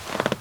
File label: chair stand fast; Apotheorasis